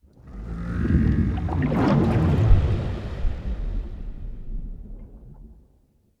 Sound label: aquatics portrait machine